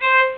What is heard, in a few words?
Fiddle